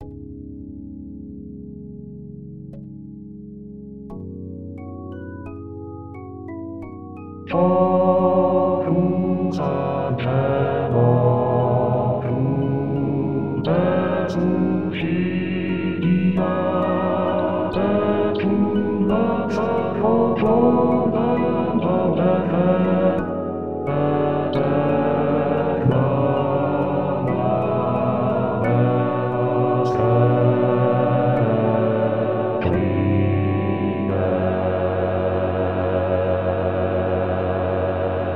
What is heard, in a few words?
in paradisum bass